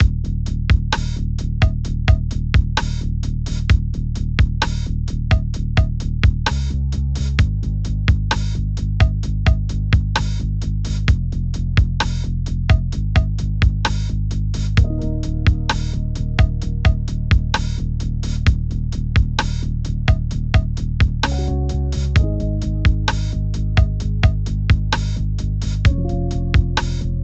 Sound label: Lo-Fi